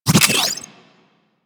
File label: Affinity Pickup; UI; Pickups; Warframe